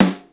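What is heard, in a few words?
snare tight, Yamaha Motif ES-Series; 300 Drum Machines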